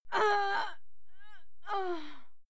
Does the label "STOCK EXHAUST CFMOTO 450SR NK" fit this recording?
No